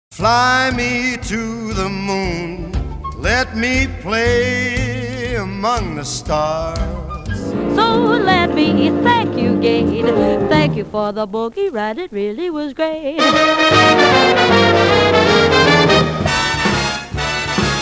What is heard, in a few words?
swingin lounge